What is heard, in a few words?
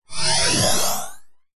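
science fiction cyberpunk robot surge power short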